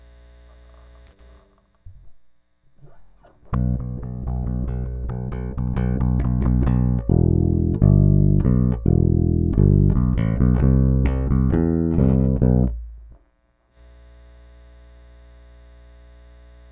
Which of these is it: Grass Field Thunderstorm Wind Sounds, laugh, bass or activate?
bass